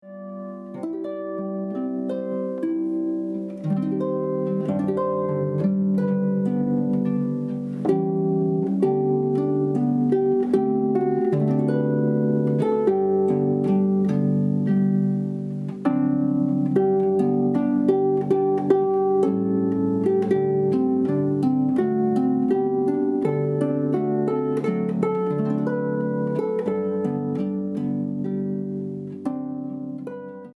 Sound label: Welsh traditional